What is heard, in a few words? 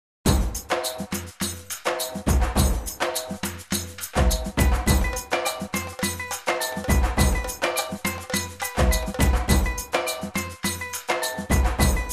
Instrumental; Hindi; Chala Mussaddi – Office Office (2011)